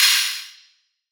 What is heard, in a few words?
Riddim Ride; Hats & Cymbals